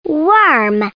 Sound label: worm